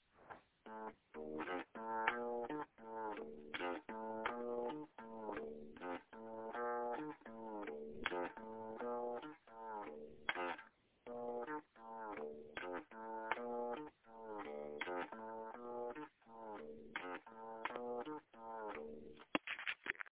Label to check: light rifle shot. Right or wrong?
Wrong